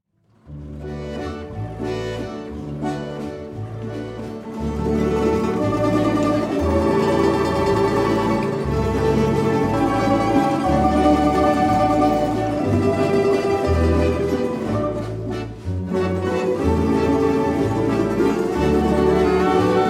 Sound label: Balalaika Waltz